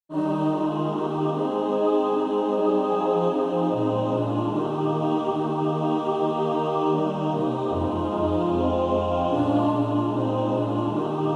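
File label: mixed, practice music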